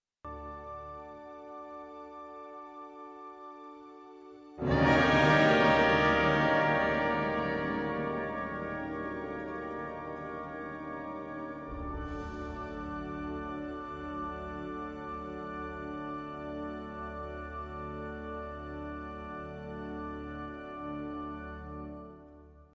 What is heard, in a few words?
penultimate chord